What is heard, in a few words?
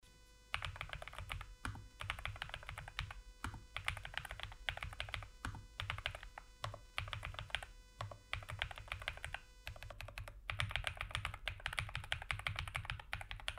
nuphy air96 v2